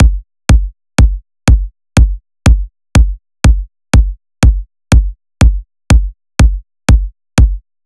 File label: KICK LOOP, 122